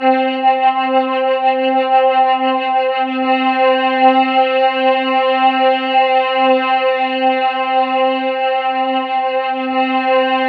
C4, Synth Leads

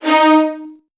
D#1, VN 16TH, Virtuoso Strings; ILIO; Kirk Hunter

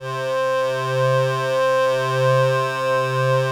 LAMBDA, String Machines

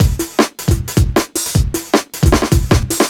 155 Fill, Drum Loops; Zero-G; Soundclash Nu Dance Electro; Acidized Wavs; 155bpm; Left of Center

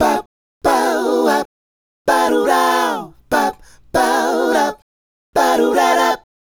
Male Group, Hip-Hop and RnB Vocals

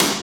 SNR, Snares; Roland L-CDX-01; Snares 6; Sn Modules 6

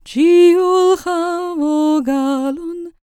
CELTIC, Vocal Planet World Voices; ILIO; Vocal Planet VOL-5

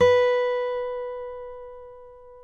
COLLINGS B 2, Guitars; Spectrasonics; Hans Zimmer Guitars VOL-2; FNGSTYLE PFM

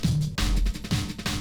break, breakcore

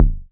Synthe Drum, Daytone Drum Synthe RDS

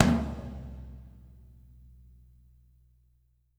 TOM 2G R; Spectrasonics; Burning Grooves; BG TOMS

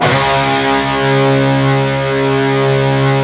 PRS, Guitars, PWR SLID

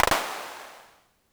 polyvox clap; Formanta Radio Plant

